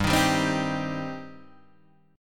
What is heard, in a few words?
G Suspended 4th Sharp 5th G, down, Guitar, Open D